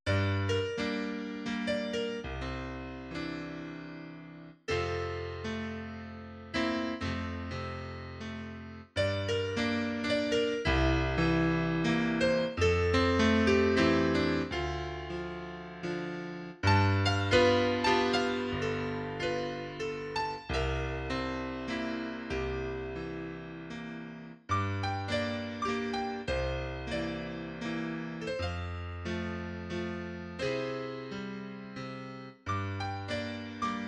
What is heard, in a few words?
Nokia Bird, piano